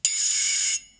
metalic swing electric hamm; metalwork

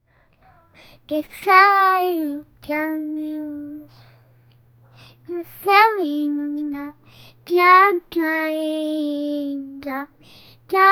a 10 year old girl sings, pop

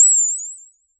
create ui and menu sound

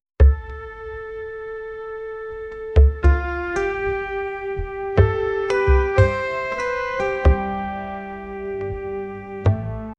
peaceful india soft patriotic

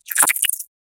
earth stone magic wisp

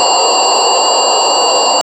constant laser passive

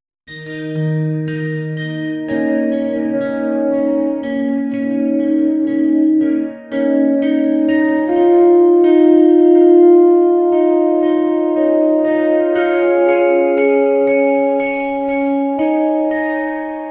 simple repeating melodic motif

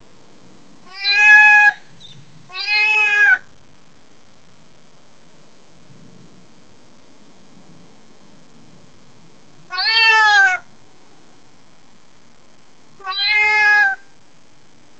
angry hawk cry cat meowin; domestic animal; domestic animals

